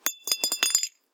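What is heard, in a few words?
glass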